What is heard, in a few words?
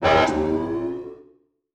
Title Transition Blurp; Adobe; Production Element